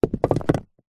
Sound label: stones large x3 set down together; foley